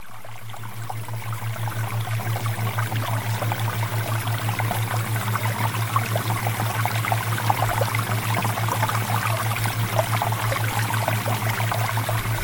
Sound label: Water Theta Waves; Premium Subliminals; Emotional Mastery